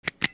OFFICE

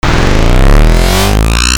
ALARMS

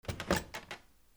DOORS

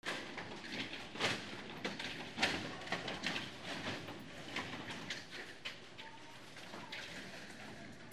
FRICTION

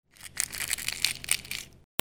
KITCHEN

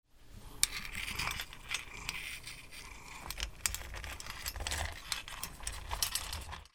ANIMALS

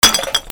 FALLING

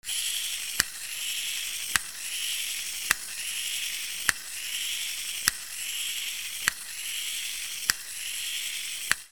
TOYS

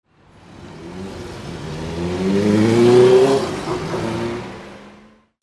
VEHICLES

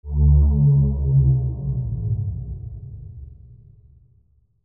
SCIFI

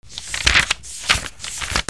PAPER

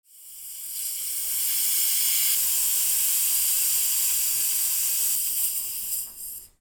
INDUSTRY

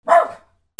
DOGS

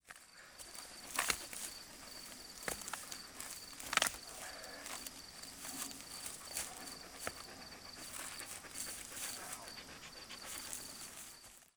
AMBIENCE

